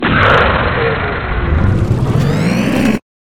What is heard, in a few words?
death